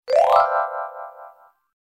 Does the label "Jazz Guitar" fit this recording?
No